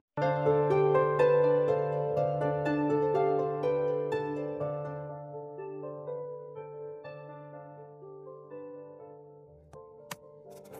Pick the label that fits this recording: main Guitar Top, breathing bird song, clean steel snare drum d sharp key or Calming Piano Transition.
Calming Piano Transition